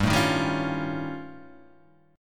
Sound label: D sharp 9th Flat 5th G, guitar